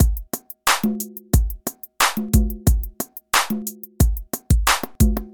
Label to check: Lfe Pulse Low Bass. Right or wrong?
Wrong